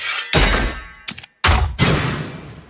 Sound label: reload; lei heng